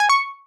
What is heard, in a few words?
Button Confirm; android ios switch windows gamerip 2014